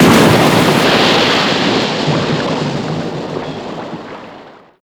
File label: mortar impact water; modern warfare 2